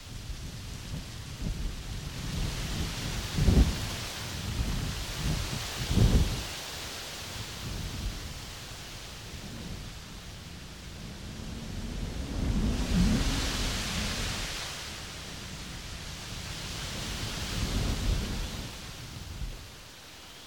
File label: Wind Trees